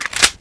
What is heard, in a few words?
glock clip in, weapons